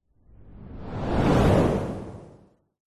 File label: whoosh